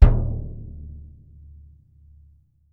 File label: BD MF, Percussion; Miroslav Vitous - Percussion & Harp